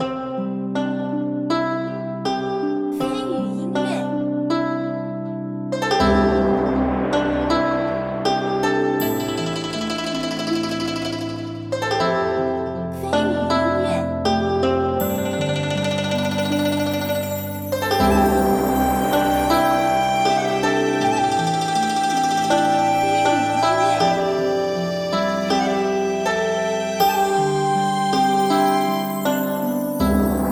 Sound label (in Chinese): 登陆